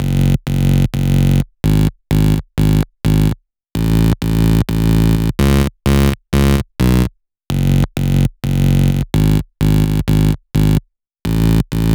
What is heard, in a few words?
Bass, 128 BPM; Vengeance Samples; VTDS2 Song Kits